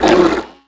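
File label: female snarl, creatures; tusken; Star Wars Obi-Wan; XBOX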